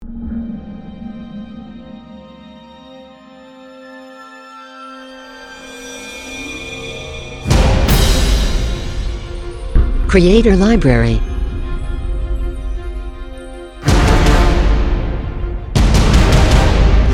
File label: Short epic trailer